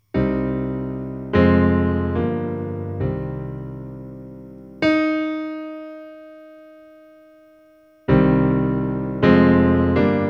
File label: Pitch Recognition (Minor)